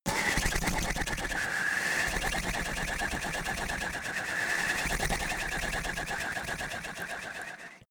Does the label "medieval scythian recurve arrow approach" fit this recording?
No